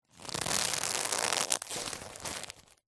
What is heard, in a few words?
tissues small packet facial handling rough creak; foley; zapsplat